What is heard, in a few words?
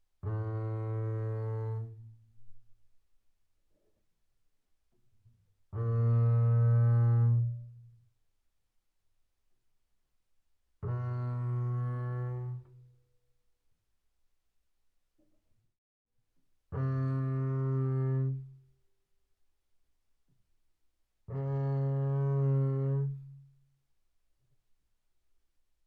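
Bass arco sul E fortissimo A2 Db3, double bass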